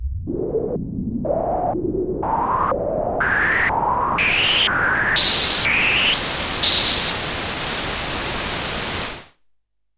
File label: Pulse, Filtered Noise